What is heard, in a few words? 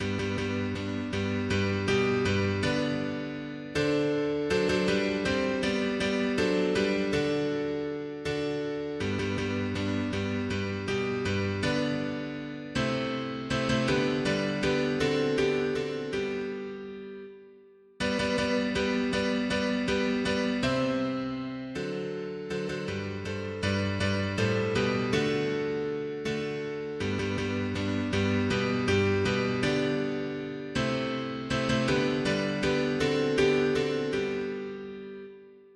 mono piano; hymns; Himnario grande